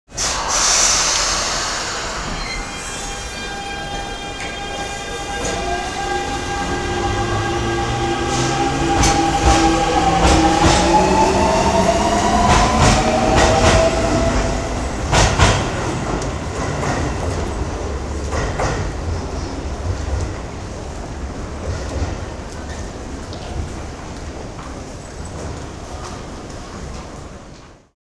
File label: metro vertrek, dutch, train